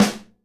SNARE DAMP, Live Snares

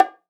Bongo Hi; Roland SPD-S

